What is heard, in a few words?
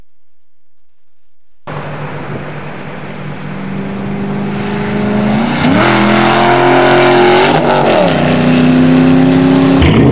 Mustang Drive By Slow